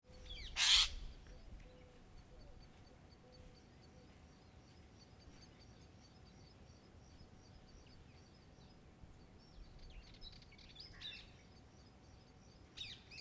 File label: country birds pigeon flies; atmosphere